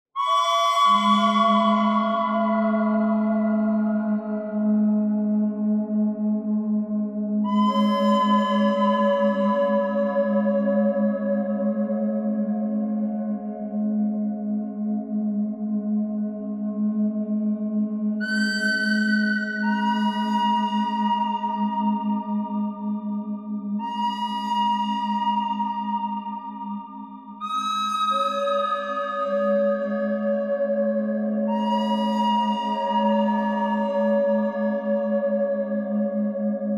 bird thread

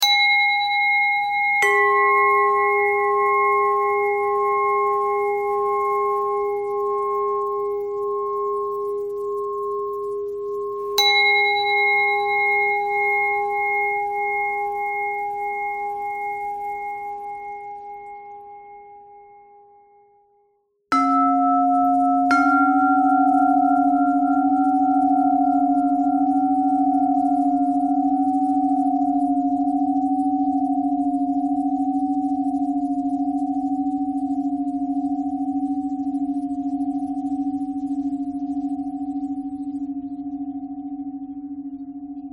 bell thread pentatonic

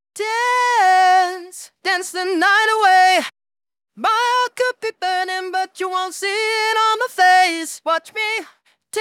Dance Blend Snippet

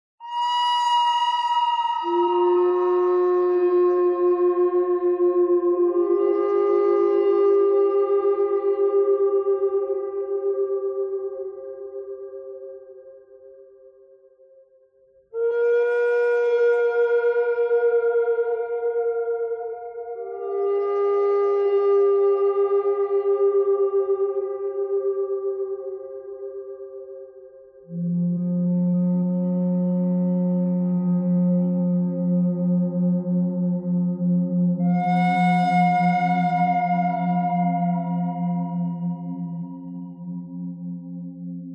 bird thread noise; noreverb

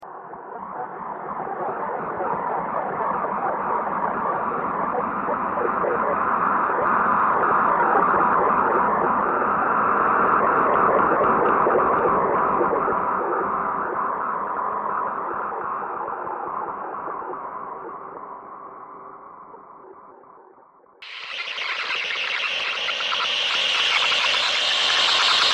weatherradio thread noise

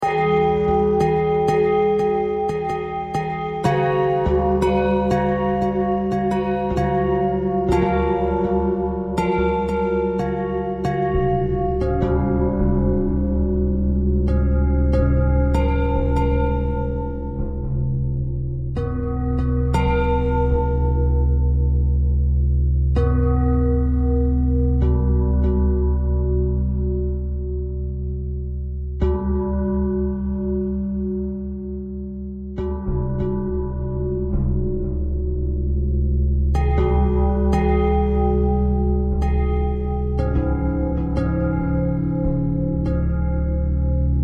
bell thread

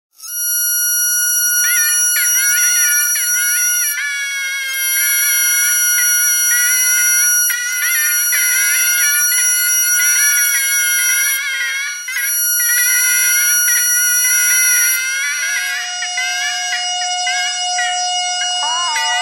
bagpipe radio bagpipe thread noise

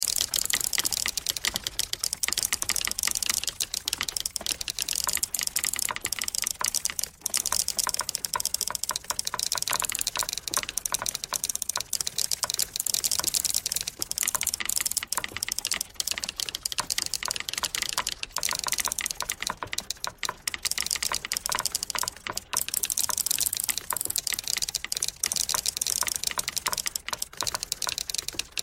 typewriter thread pentatonic all

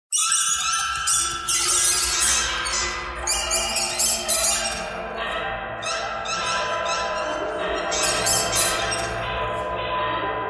birds birds thread buzz

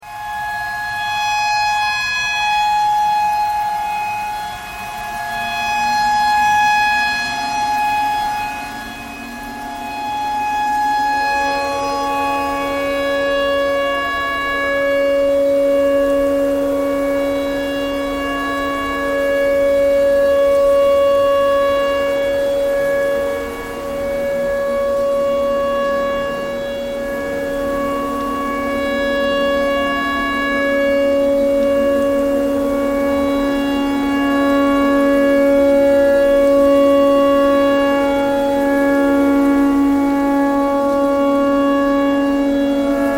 tornado siren tornado siren thread pentatonic

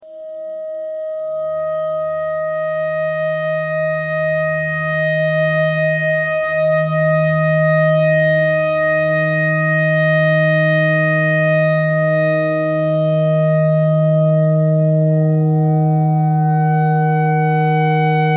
clarinet notes e clarinet notes e pentatonic

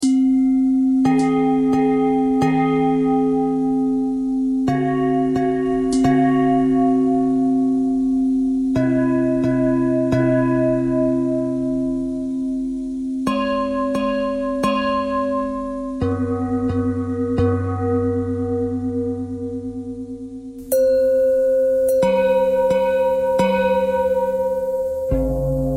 bell thread pentatonic

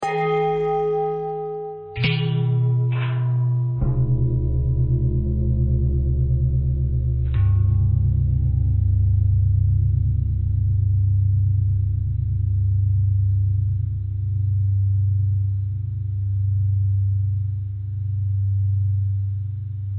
long bell bell threads, bells

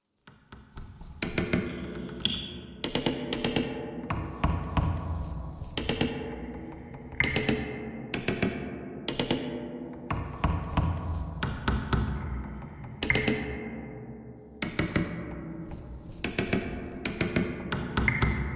bagpipe thread noise; reverb

